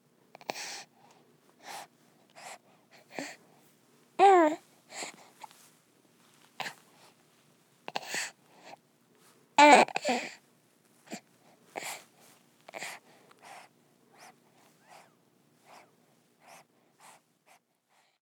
Human Baby Fussing; Babies; Voice

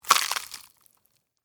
Flesh Rip; Horror; Blood and Guts; BlastWave

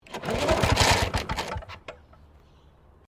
LAWN MOWER START FAIL, Old lawn mower; Victor; Machines

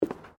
FOOTSTEPS WOMENS LOW BOOTS BOARDWALK STEP; Footstep Foley; Human

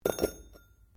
METAL ON STONE IMPACT GENTLE; Sound Effects:Materials

